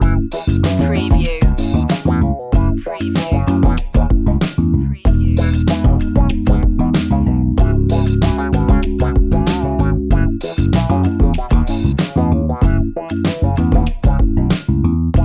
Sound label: funky and soul